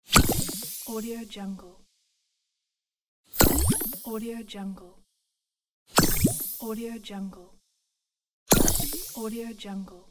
mana potion; gamechestaudio

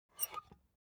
object office puncher squeak release; smartsoundfx

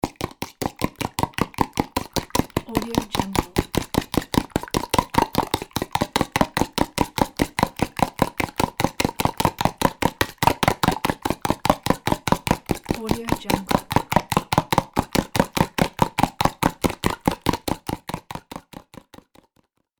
mixing eggs

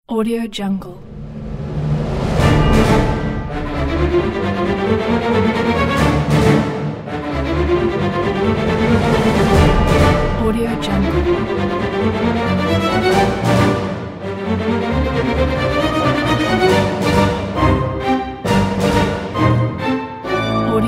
minor orchestral fanfare

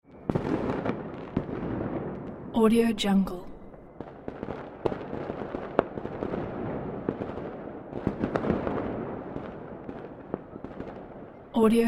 new years eve midnight

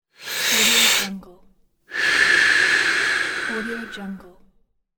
inhale exhale

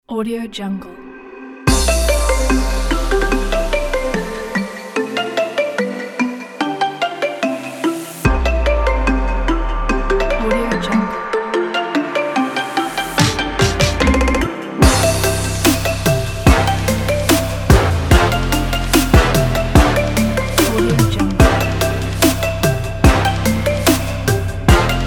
jingle bells trap; aquariusound-12

